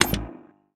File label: Monitor Next; MiSide